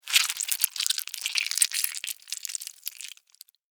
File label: Horror Squish Wet Juicy; Monsters (Sound Effects:Horror)